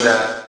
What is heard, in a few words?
Fx Vox; Equipped Music; Loungin House